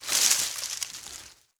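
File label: bush movement; Call of Duty Modern Warfare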